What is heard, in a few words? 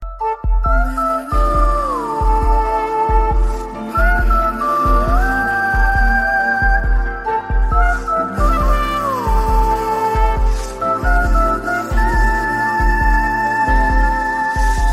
Soft Flute Ringtone